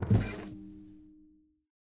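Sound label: music puzzle open; library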